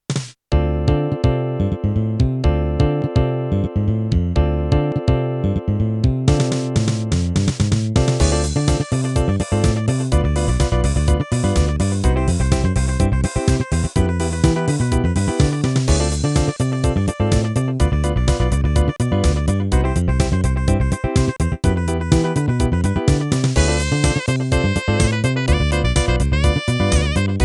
general midi funk general midi

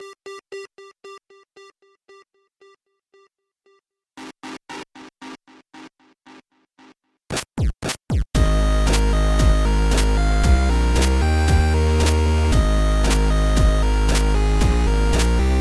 cover vocaloid, fm8, chipsounds

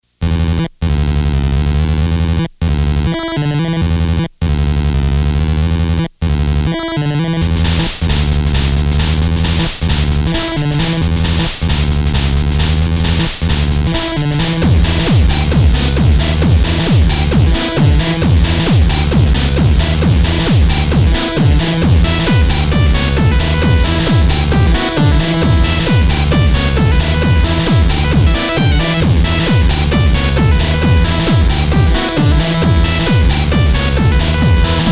funk blast

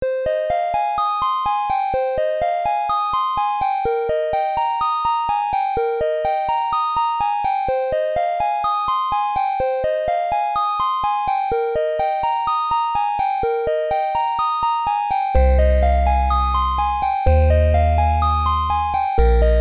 PC Engine soundfont NES hybrid

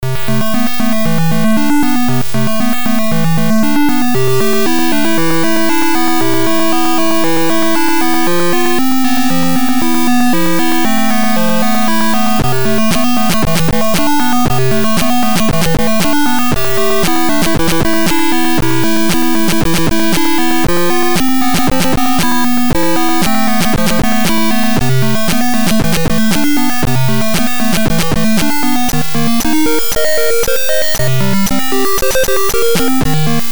1 bit

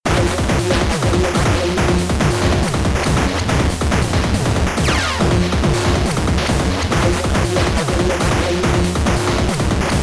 kitsch bent amp dmg; demo

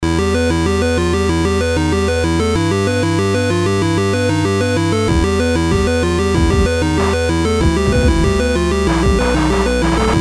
mod loop

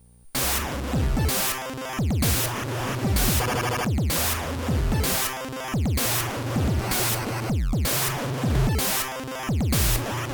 8 bit glitches

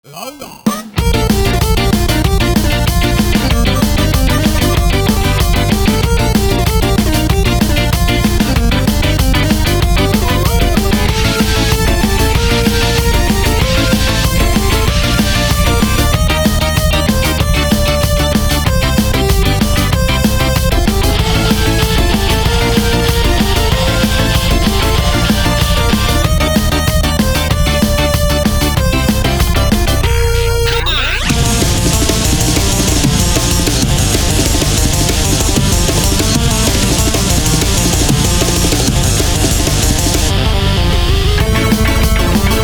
remix ska chip punk; Bubble Bobble

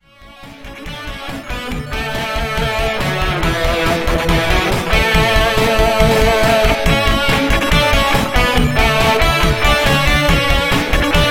mixing guitars

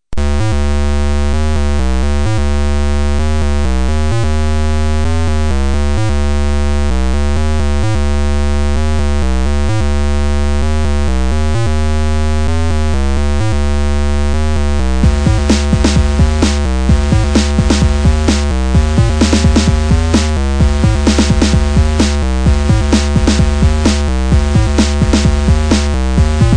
8bit skull

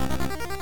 car chase 2a03

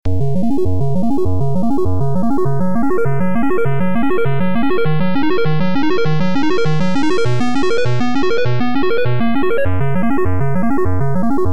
chipbreak

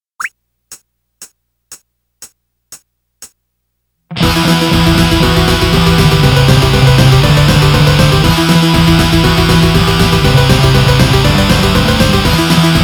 guitars

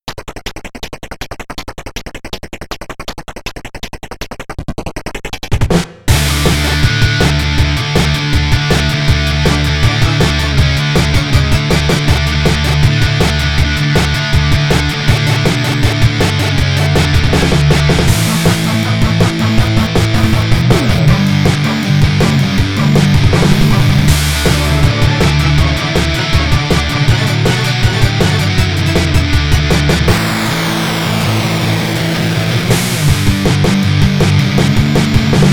misleading startup chime

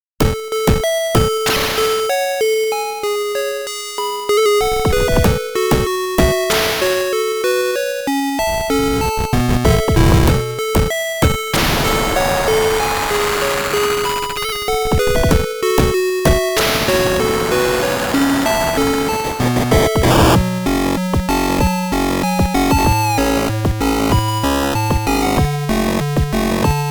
chiptune

